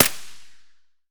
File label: ak74 silencer distant, weapons; STALKER; IWP